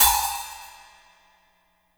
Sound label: HAT, BG HI-HATS; Spectrasonics; Burning Grooves